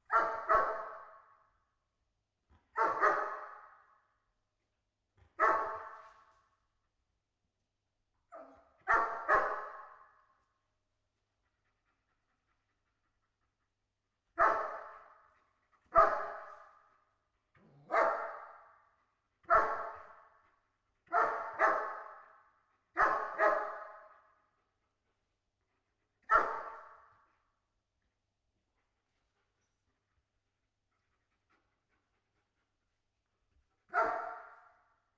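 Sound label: dog german shepherd interior bark slow distant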